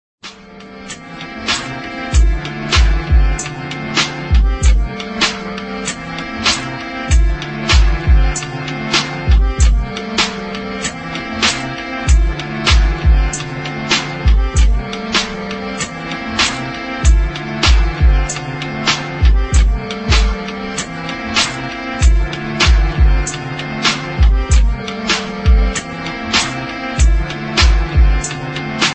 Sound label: accordian instrumental ringtone; iphone 7 plus